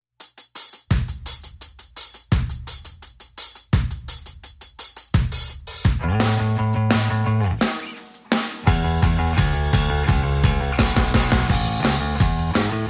Drums And Bass Mix

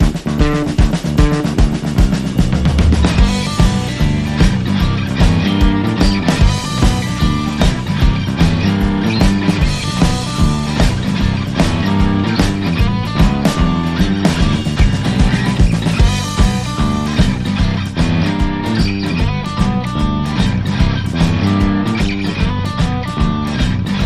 Mid West Jam, No Harmonica Alt

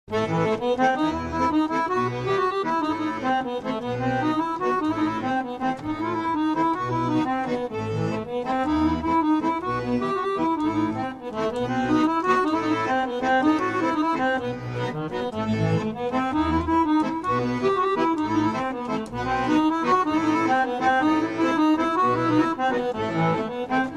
Bulgarian Trad

